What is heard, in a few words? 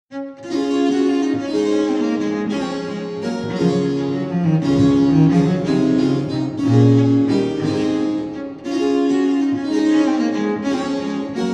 pipa